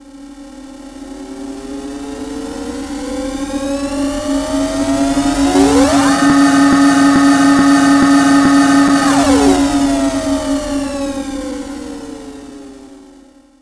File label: airlock warmup